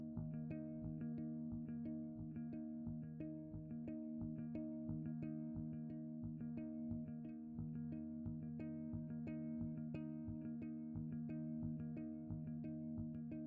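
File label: compound, This sound is simply an ascending collection of three harmonics, electric bass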